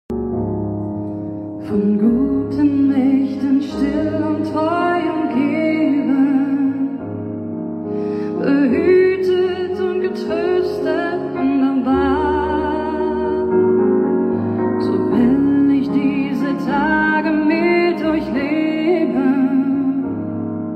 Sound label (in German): Saengerin Trauerfeier; Schweiz; Gefühlvoll; Würdevoll